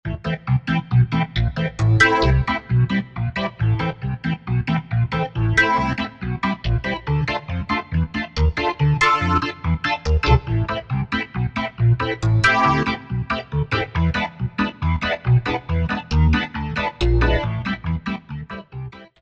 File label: Velocity Organ, Korg TR-Rack